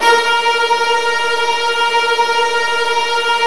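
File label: viola tremolo A#1 left, Virtuoso Strings; ILIO; Kirk Hunter Virtuoso Strings VOL-2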